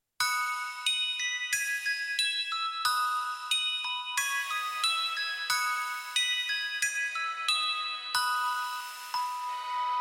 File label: Jingle